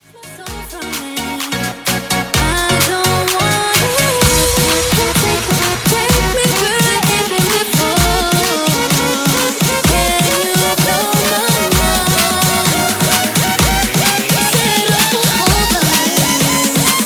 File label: 128bpm F